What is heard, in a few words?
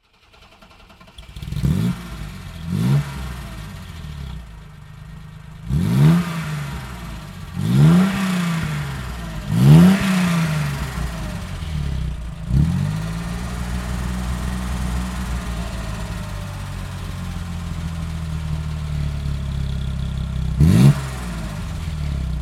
BMW 2800 CS